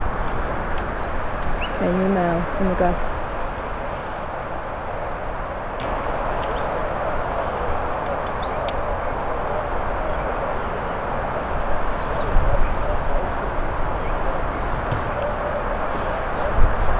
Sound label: Platypsalta nr dubia; NS